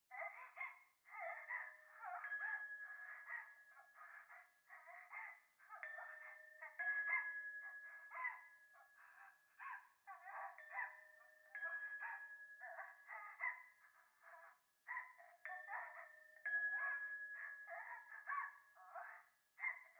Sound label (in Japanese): 風呂 喘ぎ 神社